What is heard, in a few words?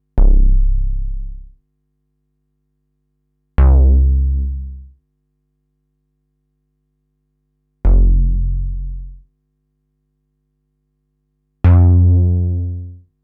DEEP SEQ BASS COTK Model 15